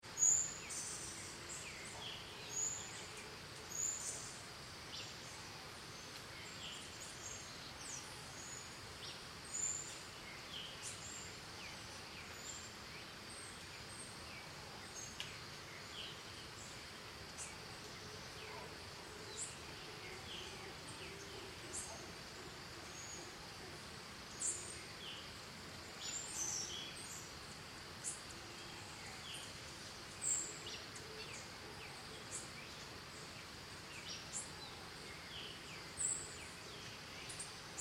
Birds Crickets Costa Rica Morning Distant Monkeys, forests and jungle; Backgrounds; BigRoomSound